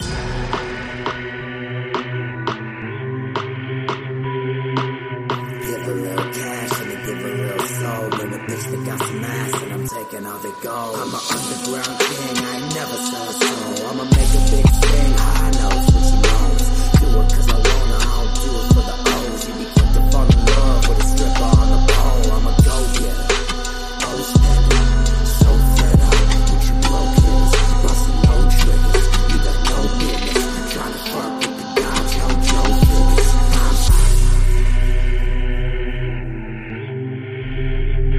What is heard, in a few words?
Remix